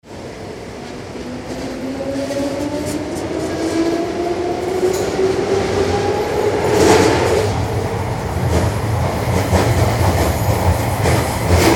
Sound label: underground cabin inside